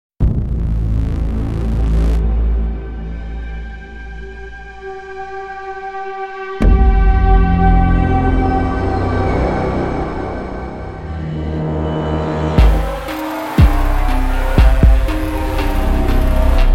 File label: BRAAAM Strike; Sound Yeti